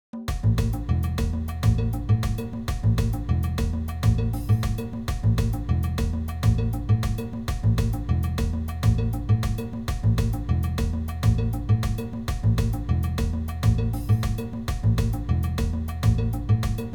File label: salsa